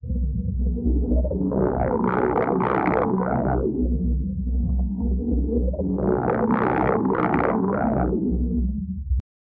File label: alien ship rotating sound